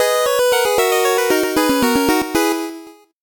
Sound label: 8bit jingle fast